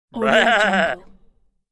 cartoon sheep happy laugh

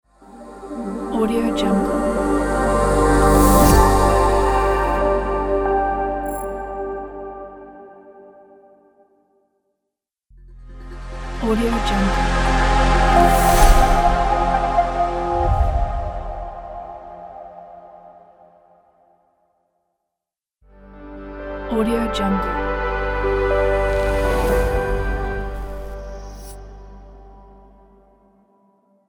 modern logos pack

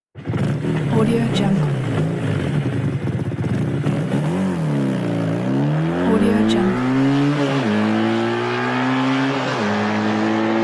motorcycle speeds away

